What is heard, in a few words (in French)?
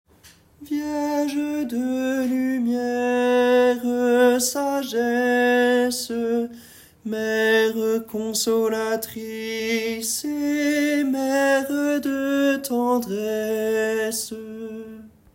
Chant du Jubile Couplet Tenor; sanctuaire